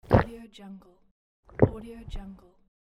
sipping

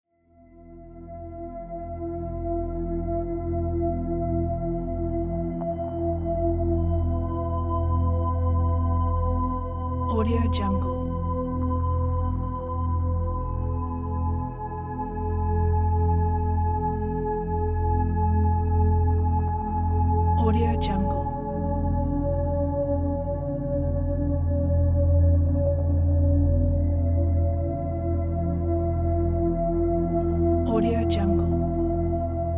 meditation chakra

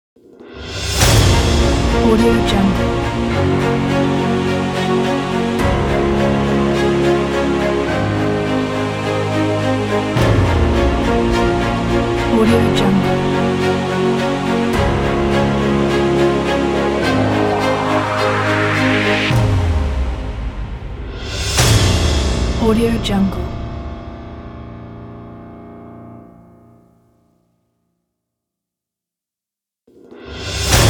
action epic cinematic logo

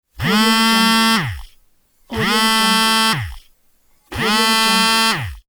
cell phone buzz; ldj-audio

